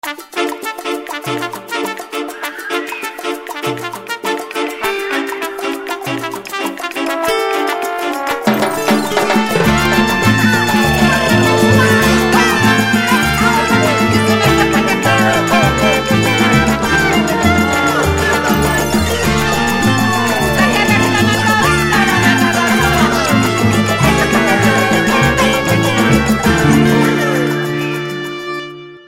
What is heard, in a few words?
MainMenu; Smeshariki Museum of Communications